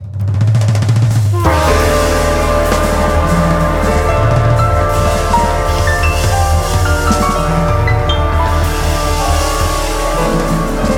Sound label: Progressive Synthesis Stage III